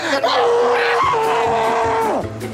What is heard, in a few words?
pillow scream